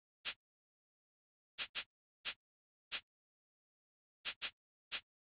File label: Drums, 090 Cmin; Hip Hop Exotica; Apple Loops; Big Fish Audio